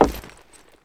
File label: footsteps, wood; player